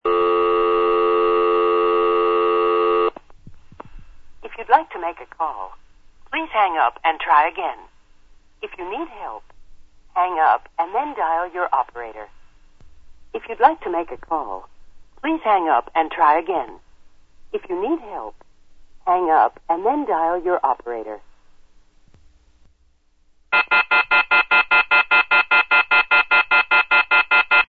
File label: offhook